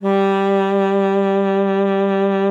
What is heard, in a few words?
G3, alto sax; Xsample VOL-2